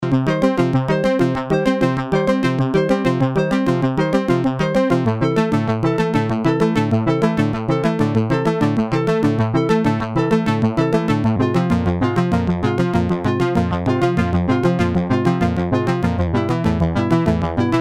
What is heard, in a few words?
Syst 700, modular; modular planet